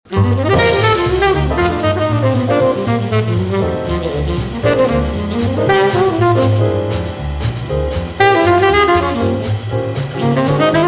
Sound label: solo